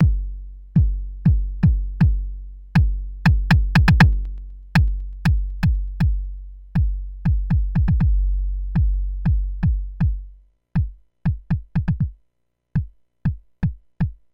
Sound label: eq comp gat; mbase11